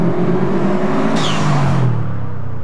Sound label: mach 1 whistling trumpet atmosphere drive by